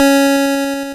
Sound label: GB lead C#; ChipShop Deluxe; ChipShop Hits